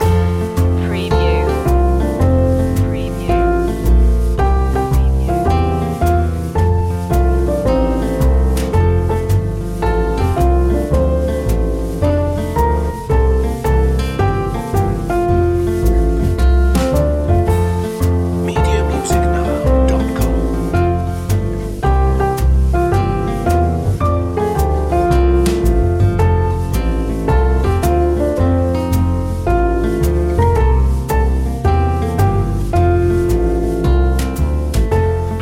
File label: go tell it on a mountain jazz long loop